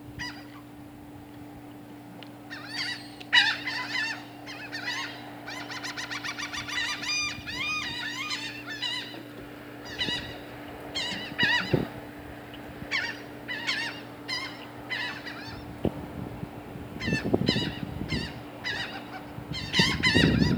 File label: laughing gull